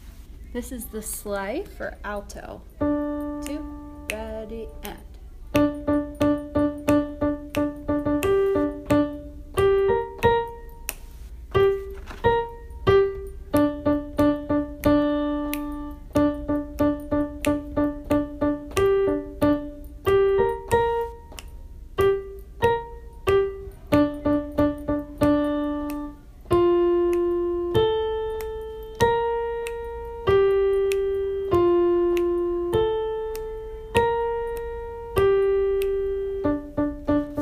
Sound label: the sleigh alto